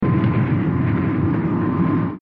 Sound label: Engines on idle